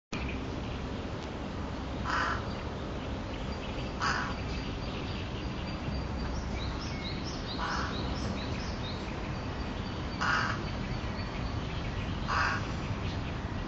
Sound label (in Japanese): hashiboso